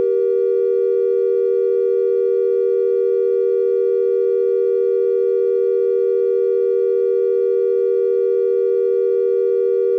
seven equal VI

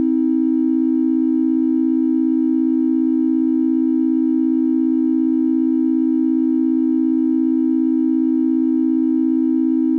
seven equal I

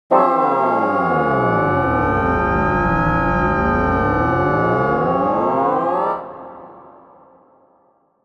50 notes bassoon glide; midi

